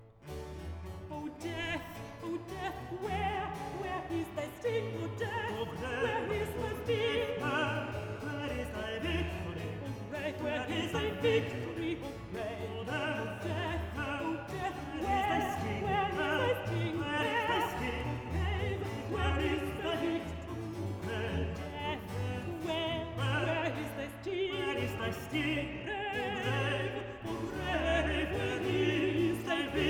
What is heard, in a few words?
Duet O Death where is thy Sting; Handel; Messiah